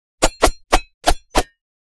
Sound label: dagger